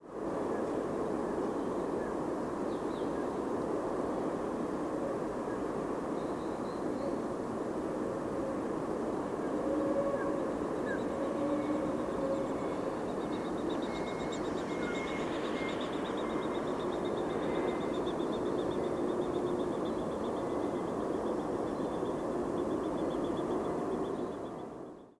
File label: calls and wingbeats